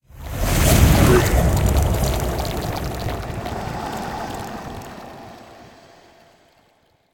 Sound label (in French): Coprs Vomi Fx; Puddle